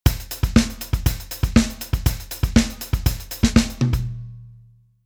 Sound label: Rhythm Samba